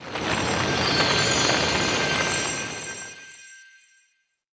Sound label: effect blessing fire; feheroes